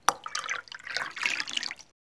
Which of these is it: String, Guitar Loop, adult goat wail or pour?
pour